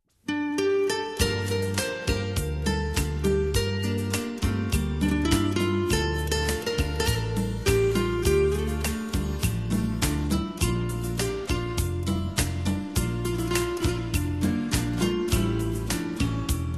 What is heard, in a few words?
hoa tau guitar